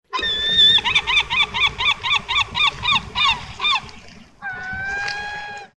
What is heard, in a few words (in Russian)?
istoshnyj krik chajki stojashhej na kamne